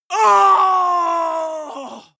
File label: male combat die; schreie; menschen